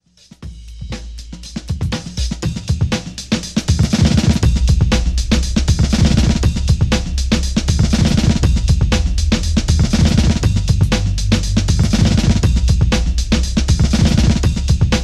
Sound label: inside beats